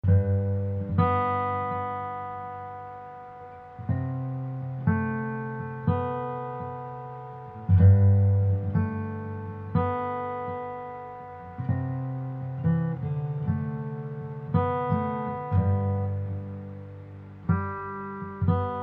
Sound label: radio bgm